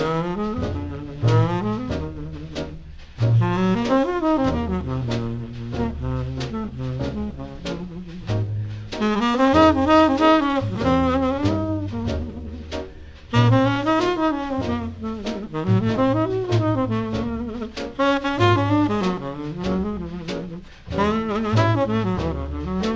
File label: jazz